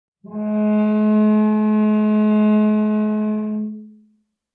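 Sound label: Cello arco fortissimo sul C Ab3; MIS Pitches - 2014; Strings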